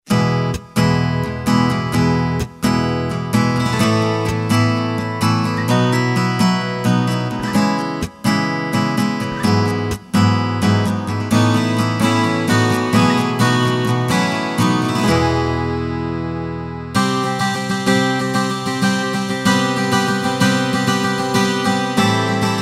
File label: acoustic guitar